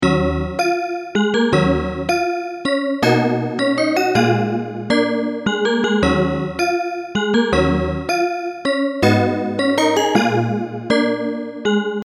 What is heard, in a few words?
Ebm 160 BPM, R&B MIDI Melody; Pressure; R&B MIDI Melody Collection